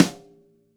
smooth bottom end snare single hit a sharp key; snare drum samples

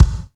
mid range bass drum one shot a key; kick drum samples

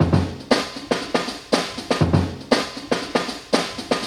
118 bpm drum groove f sharp key

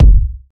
hot kick drum single hit f sharp key

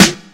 airy steel snare drum sound a sharp key

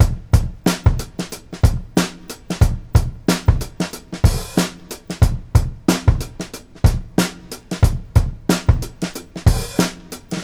92 bpm drum loop c key

